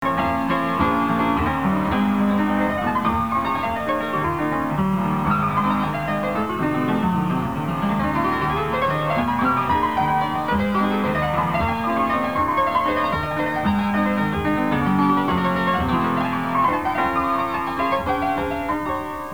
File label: maple leaf rag, music box